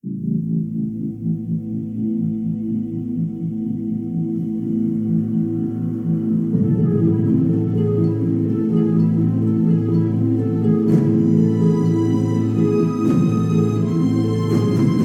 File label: Soundtrack; Godzilla 2014